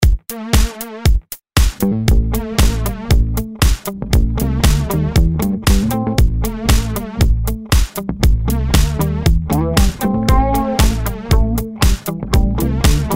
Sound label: FUNK